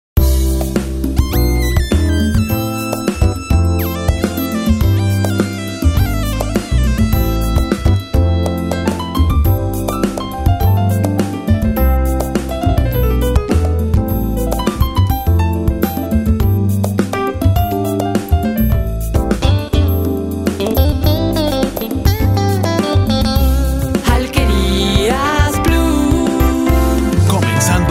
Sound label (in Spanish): Jingle; Alquerias Plus; Blanchet